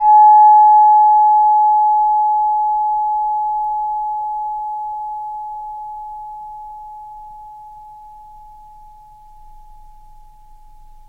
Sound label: chime; Neptune